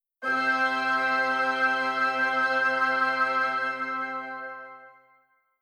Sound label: WW All